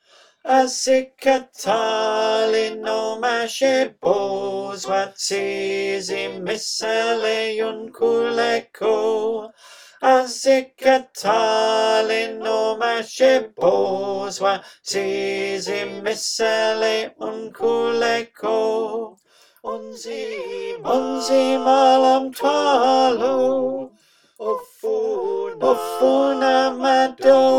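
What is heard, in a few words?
Tenor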